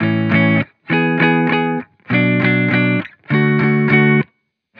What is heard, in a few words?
Vibrations Pop Guitar Loop 100 BPM A Maj; Cymatics; Vibrations Guitar Sample Pack